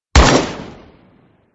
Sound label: fire; sniper